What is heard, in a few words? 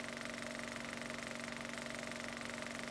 Projector Loop; Cars; XBOX; Ambient